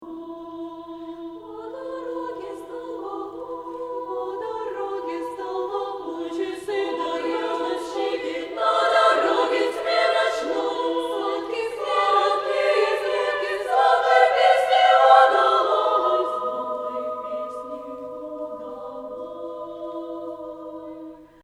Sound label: Xor Female